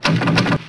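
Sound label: Bow Release Multiple; Civ4 warlords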